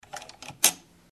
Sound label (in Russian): lapku opustili shvein mash